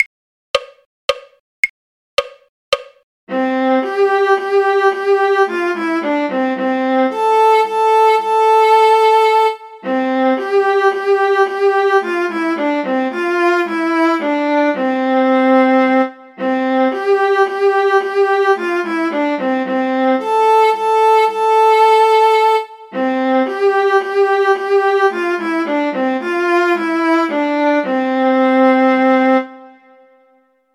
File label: viola; folk